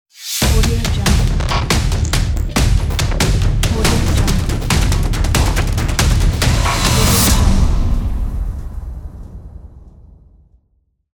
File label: action trailer logo; macro-media